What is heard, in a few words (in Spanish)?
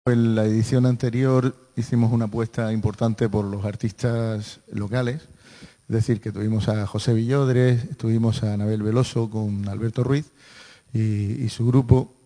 Flamenco